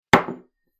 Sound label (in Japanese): グラスを置く音